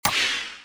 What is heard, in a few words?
button Auto Spin Off; slots; ui